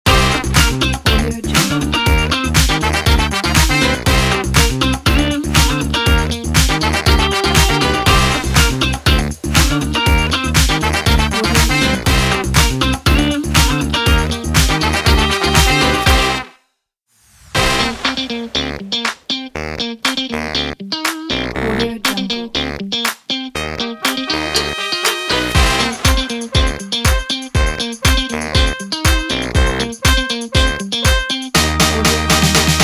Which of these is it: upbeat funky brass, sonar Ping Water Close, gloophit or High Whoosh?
upbeat funky brass